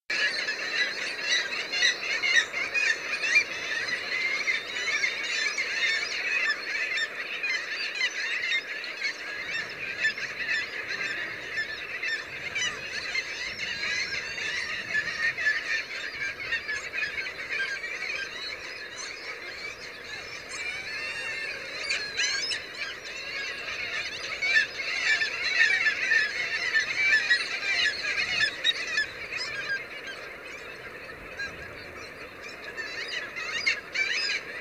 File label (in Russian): moevka golos